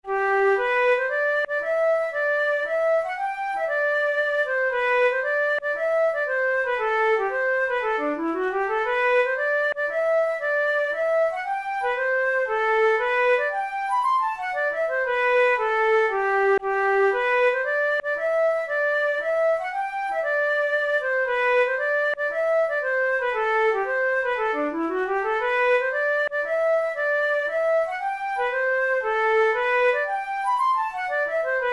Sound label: hornpipe, flute tunes